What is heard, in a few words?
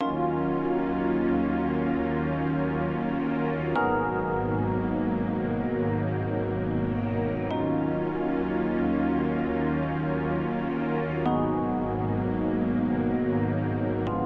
rnb velvet hues; loopazon